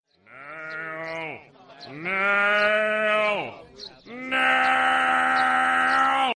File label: Screaming